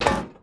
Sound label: zombie on car; hl2 ep2; npc, fast zombie